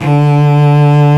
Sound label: ARCO CELLO, Solo String; E-MU Producer Series Vol. 2; More Studio Essentials; Composer; Solo String Combo